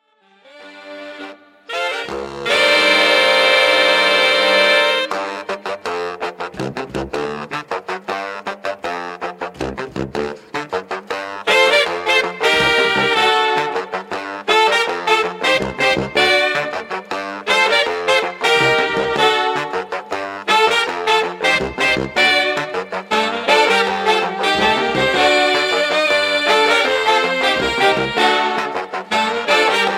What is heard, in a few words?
Funky Sax Sax quartet; Mib/Sib; La première méthode du tout petit saxophoniste